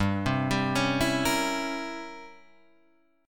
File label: C 7th Flat 9th G